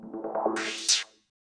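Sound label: PERCUSSION ELECTRO 140, Techno Drum; Techno2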